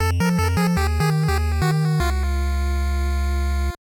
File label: Incorrect; Sesame Street ABC; NES